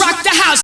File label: Vocal Phrases; Vengeance Samples; Vocals